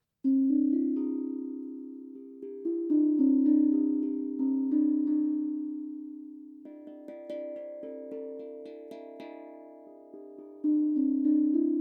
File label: Tank Drum C Minor